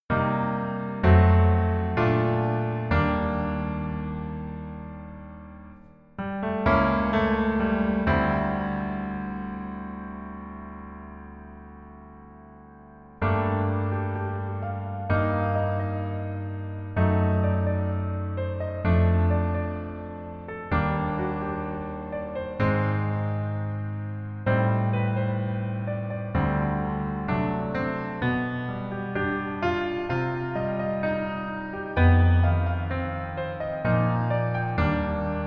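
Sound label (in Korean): Piano version 멜로디MR -1키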